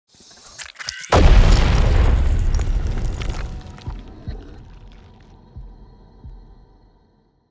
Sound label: heart destruction explosion